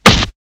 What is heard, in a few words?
step; pianosaurus